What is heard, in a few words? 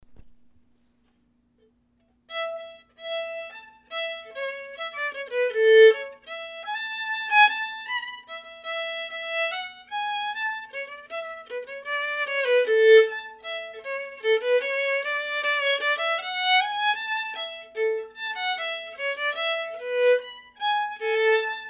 hedgehog waltz